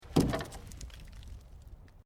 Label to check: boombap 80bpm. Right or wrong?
Wrong